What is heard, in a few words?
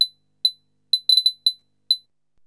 GUIRO; DRUMS & PERC